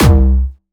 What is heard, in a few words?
Jumpstyle Kick C#2, vengeance essential bassdrums; Jumpstyle Multis; vengeance essential club sounds-3; VEC3 Bassdrums